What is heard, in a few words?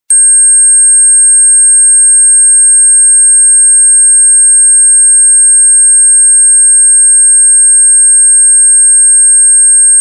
Ultra Sound